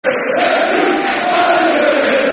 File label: Football Chant (Soccer Songs); Myrie-Williams; Tranmere Rovers Trfc